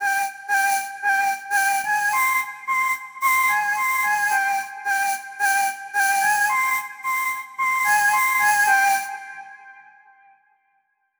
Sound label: Lotus Flute 110bpm G min; New Nation